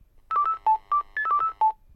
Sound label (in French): Telephone GIGASET DA710 Sonnerie